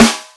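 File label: PICCOLO SNARE; Greytsounds; Scott Peers Sound Engineering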